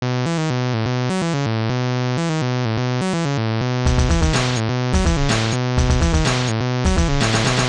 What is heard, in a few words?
break